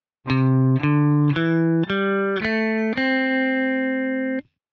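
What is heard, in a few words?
guitar major pentatonic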